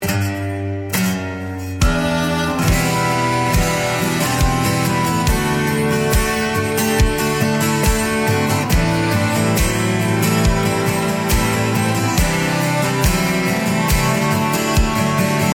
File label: Instrumental